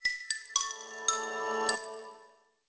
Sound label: New Nokia Startup; Nokia 105